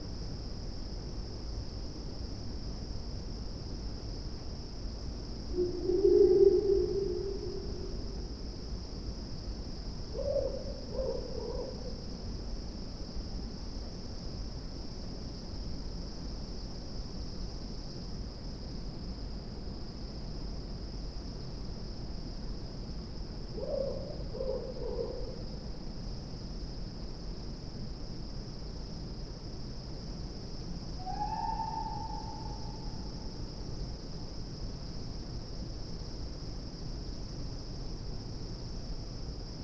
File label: Night Ambience; Gauntlet Seven Sorrows